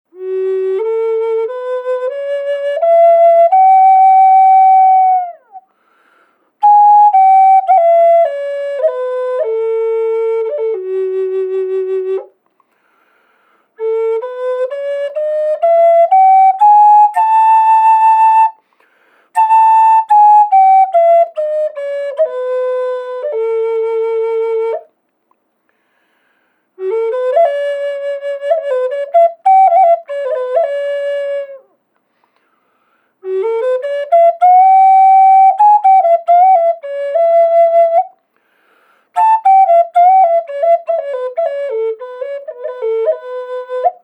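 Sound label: birds eye redwood fsharp minor, flutes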